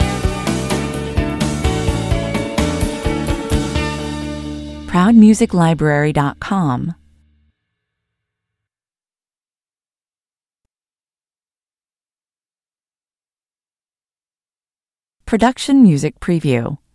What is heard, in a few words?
corporate stinger